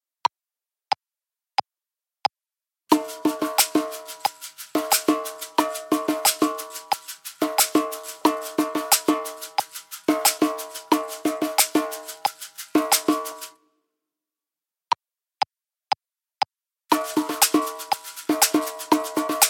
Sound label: Caxixi; shakers